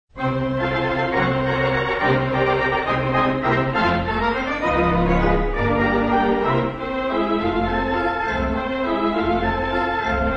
allen pretty poporgan, theatre organ

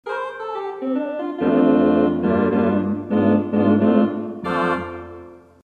4' Tibia 8' Clarinet, theatre organ

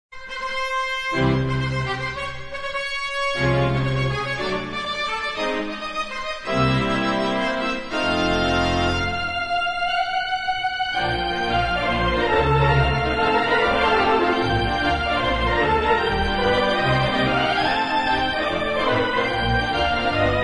theatre organ

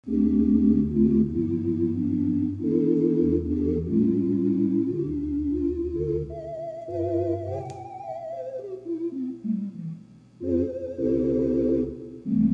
8' Tibia, theatre organ

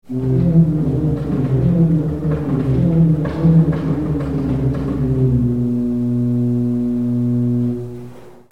ped full, theatre organs; The pedalboard is a big keyboard, played by the feet.

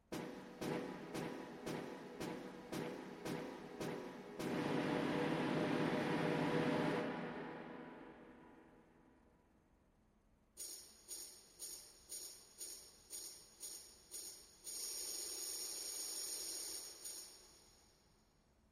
16 Traps ACCOMP, ranks sounds; p450 ranks

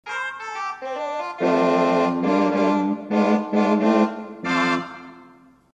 4' Tibia 8' Oboe, theatre organ

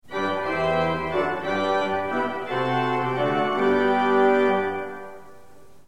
sw full, theatre organs

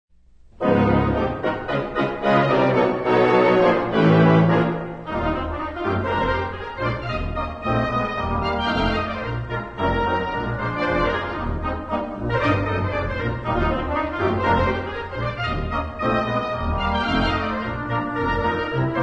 highhat, theatre organ

